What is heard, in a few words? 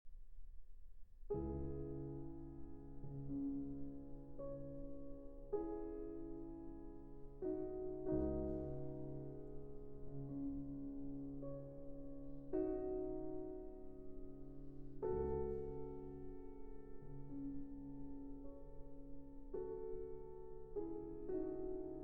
Piano Sonata in B-Flat Major, Andante sostenuto